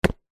foley footstep single boys sneaker on concrete

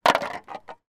empty drinks can roll or drop on street; foley

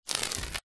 duct tape peel from wood surface; industrial

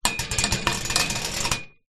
small metal pole scrape along thin grated metal; foley

